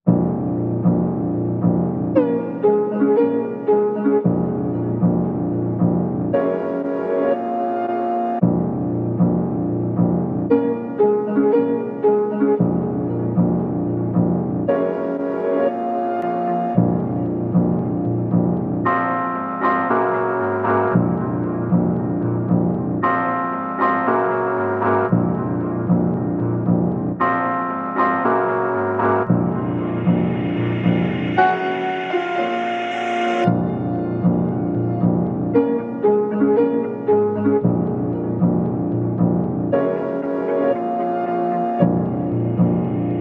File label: No Perc